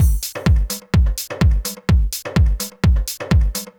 Dance Drumloop

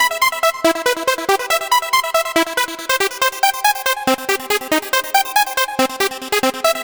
Synth

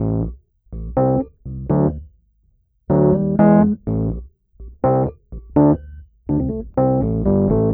E. Piano

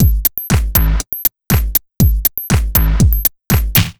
Electronica Drumloop

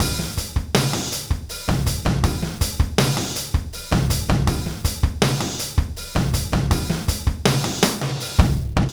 Acoustic Drumloop

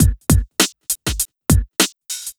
Hip-Hop Drumloop

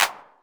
Clap; Drums & Percussion; One Shots Drums

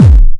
Kick, Drums & Percussion One Shots Drums

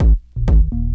Dance Drumloop; Drums & Percussion

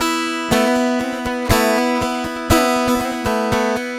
Dulcimer

